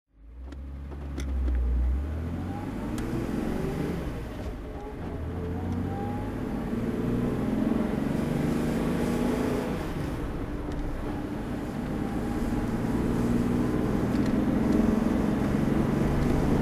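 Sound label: mercedes benz 220se w111 convertible driving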